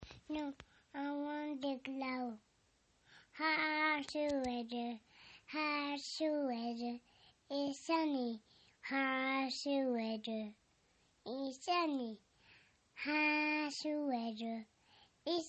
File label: song